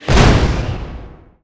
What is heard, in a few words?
skill gun